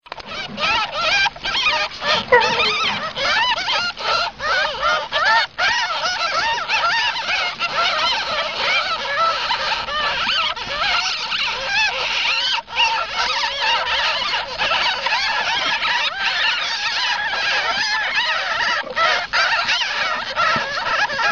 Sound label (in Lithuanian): kalba seskiukai garsas